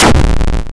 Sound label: explosion